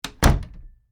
Door Close French, Foley, Door, Close, French, Door; Blast Wave